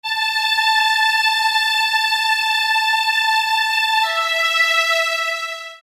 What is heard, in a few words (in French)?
Violon Attaque